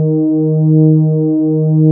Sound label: PD DARK, Nord, LEAD PAD HRN; Wizoo - Powered Nord